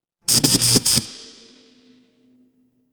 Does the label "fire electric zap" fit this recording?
Yes